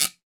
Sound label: guiro; NorthStar - Global Instruments VOL-2; Guiros